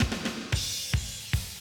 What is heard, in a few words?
110, Classic Drum Loops